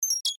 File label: UI Deny, User interface feedback sounds; Ui Set C; Computers; Electronics